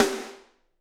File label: SD, Drums; Bob Clearmountain Drums I